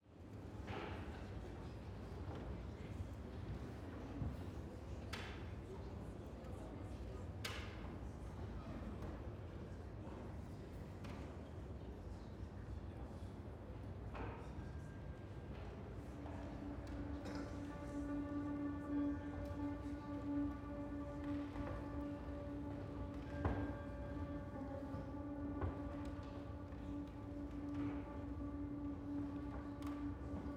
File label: PIANOISE; Cirque Electrique